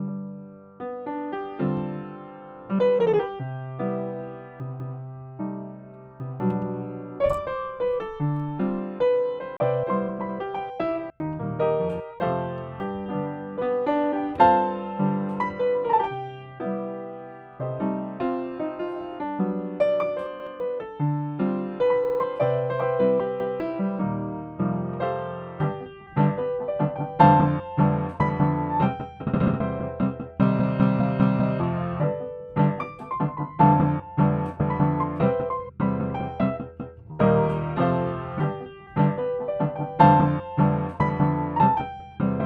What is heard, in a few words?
credits earlier piano version